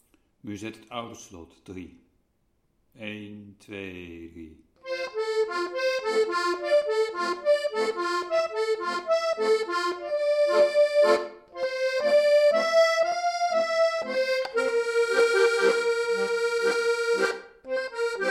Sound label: musette